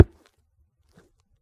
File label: footstep2; Character; footstep sound